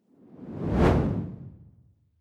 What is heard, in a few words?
whoosh simple hard low